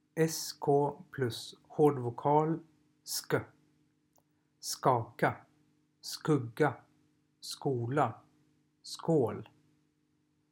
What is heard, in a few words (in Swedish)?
vokal